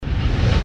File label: Level Up; Menu Up Animation